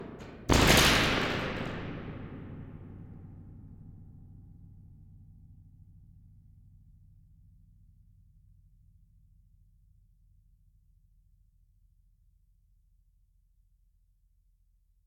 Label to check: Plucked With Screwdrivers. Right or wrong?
Right